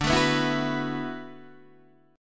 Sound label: D 6th Add 9th, Guitar, DADGAD